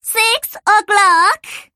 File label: clock